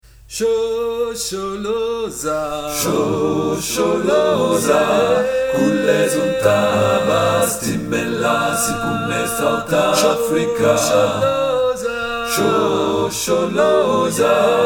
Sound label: Tutti; Shosholoza